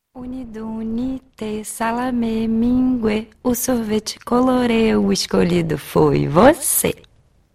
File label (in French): Comptine Uni duni te, PORTUGAIS